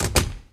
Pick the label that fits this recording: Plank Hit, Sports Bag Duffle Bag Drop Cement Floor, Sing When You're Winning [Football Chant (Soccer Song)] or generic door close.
generic door close